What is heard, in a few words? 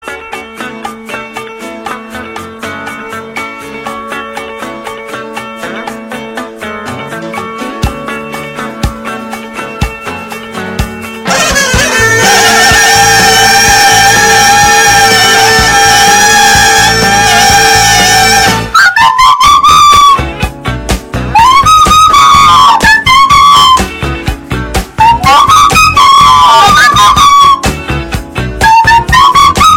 Flut Version Meme Song